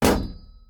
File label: shield block